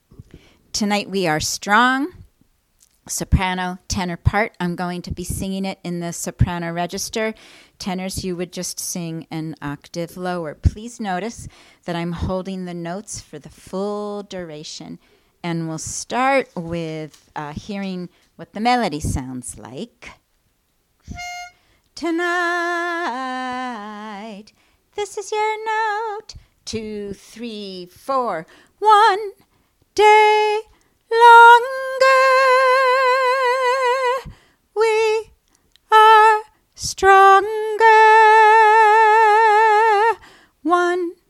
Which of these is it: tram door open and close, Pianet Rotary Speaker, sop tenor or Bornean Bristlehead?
sop tenor